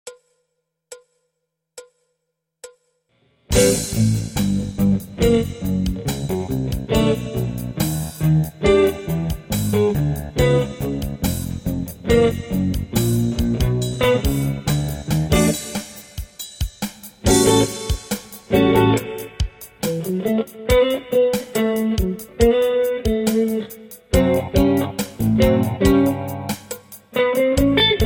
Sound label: Funk slow